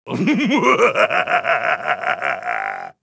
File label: muahahaha